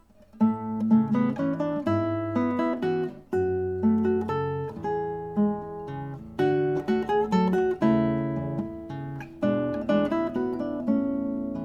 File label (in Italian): Vals melodico